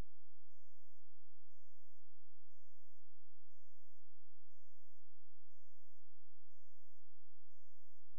A#, Synclav FM; Big Fish Audio; SPACE1

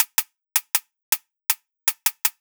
Ragga & Dub Reggae; USB Soundscan vol.27; AKAI

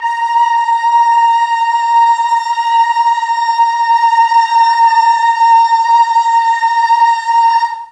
A#3 BOYS CHOIR, Mellotron; Mike Pinder - Mellotron Archive CD

